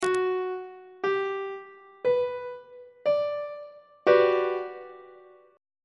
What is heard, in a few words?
f double sharp major 7th 3rd inversion on treble clef